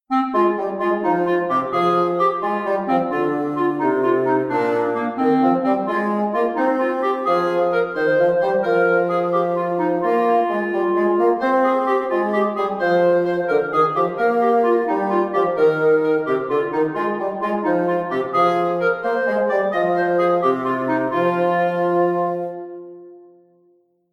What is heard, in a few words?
F Dorian